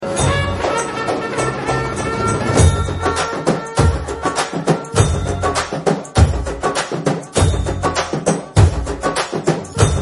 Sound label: Beat